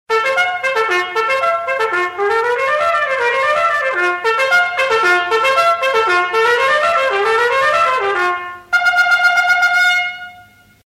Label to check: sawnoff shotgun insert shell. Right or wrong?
Wrong